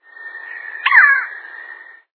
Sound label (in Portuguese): pica pau de barriga vermelha